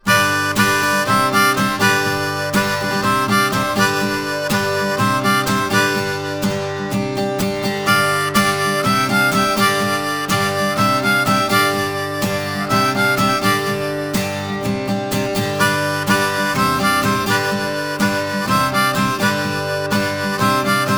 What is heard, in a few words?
Harpriff, Blues Harp